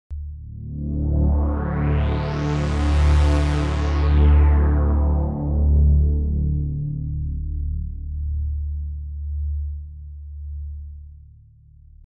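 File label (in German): Efx Fläche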